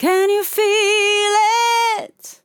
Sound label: LYRICS Fills 120bpm Fm; can you fill it